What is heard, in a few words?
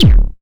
Dred Kick; Cwejman S1; DevSnd Cwejman S1 - JK